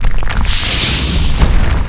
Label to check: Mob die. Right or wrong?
Right